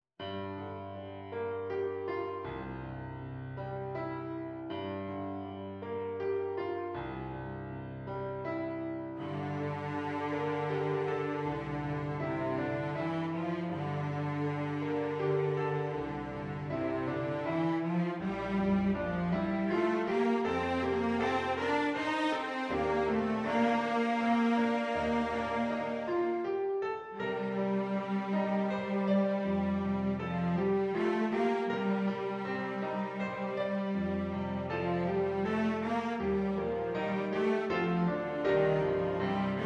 CELLO

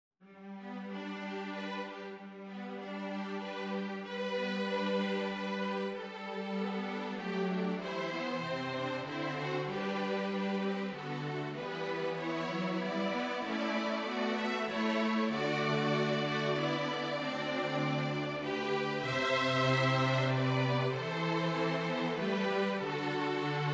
String, string quartet; While Shepherds Watched their flocks by night

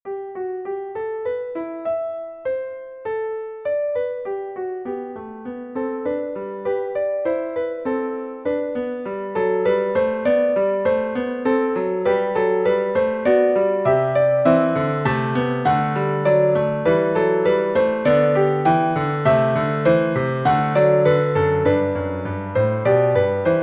Playful Fugue 144, piano solo

